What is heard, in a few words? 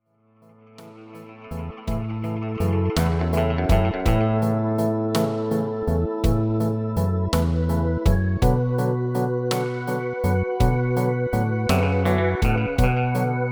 Instrumental